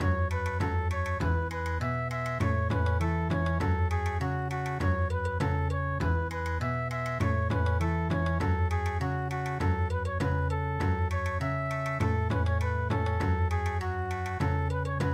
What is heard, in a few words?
Bon Odori